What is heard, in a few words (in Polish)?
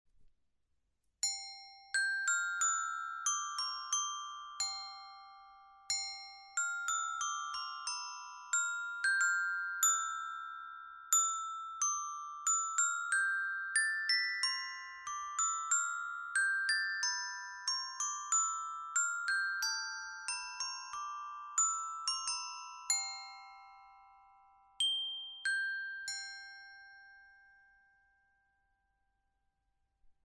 kolorowe dzwonki